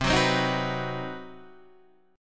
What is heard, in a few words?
C Minor Major 7th Flat 5th chord, Guitar Open D